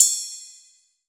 FX CYMB

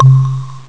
mega glas bongo; Amiga Soundtracker Sample Packs

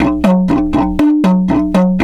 120, Didgeridoo; Didgeridoo and Other Primitive Instruments; 120BPM

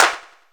clap, Yamaha RX-5

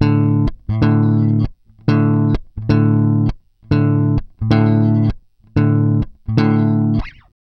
Groove Pluck, Bass; Bass X

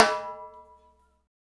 PRC ETHNIC PERC; Roland - LA Composer vol. 1

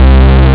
brass string; Amiga Soundtracker Sample Packs

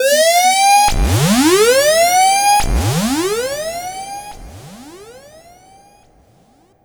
Sfx, Trance; Zero-G Trance Inducer; General Sfx

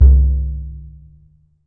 EDGE TONE, Surdo; E-MU Producer Series Vol. 2; More Studio Essentials; Composer

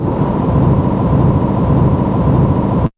Gale, Weather; Fairlight CMI IIe

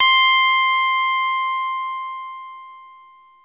Techno leads, hits and riffs

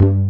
PANDOG BASS; Pro-Rec - Synthsonic; BASSES

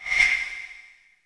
IND; Zero G; Man Machine

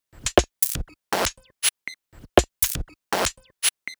Odd Glitch, 120 BPM, Percussion; Sonic Foundry (Sony Creative Software); Underground UK House & Electro

